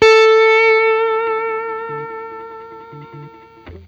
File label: BLU LONG VIB, Guitar; 90 s Sample CDs; Quantum Leap - Guitar & Bass